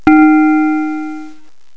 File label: bell note, notes; ClickArt 300,000